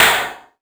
One Shot Snare, Drum N Bass - Percussion

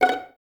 Violins F#5 Pizz; String Ensembles; Miroslav Vitous; 11 Violins

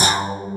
SYN MOUTH, BASSES; Pro-Rec - Synthsonic

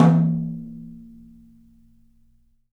TOM, Studio Toms; 3D Percussives

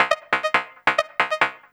drum, 138, drum-loops, Techno

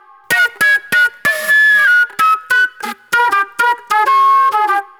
NY 70's Jazz Flute

